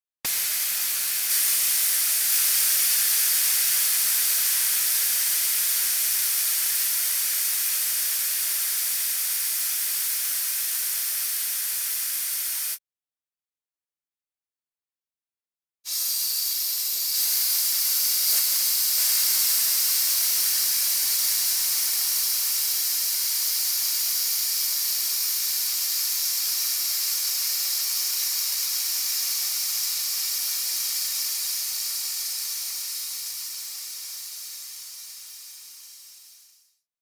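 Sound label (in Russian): Спускается колесо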